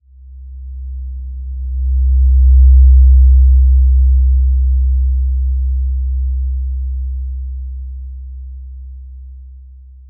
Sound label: Simple Glow C2 f; HSS-Custom-1; healing soundscapes